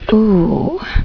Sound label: "oooohh"; Virtual Valerie 2; Desktop Themes